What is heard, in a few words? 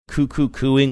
coo coo cooing